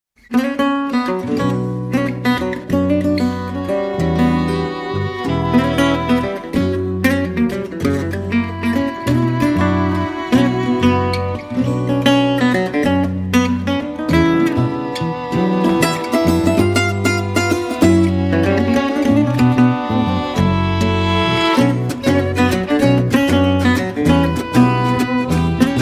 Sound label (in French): Bossa beguine